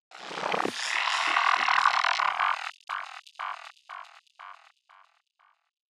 Movement; 99Sounds; InterSpace

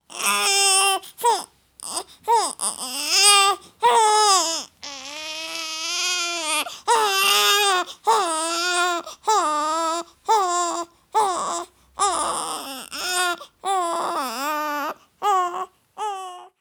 baby crying

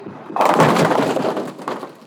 9-Pin Strike Hit Far, Bowling; SoundBits

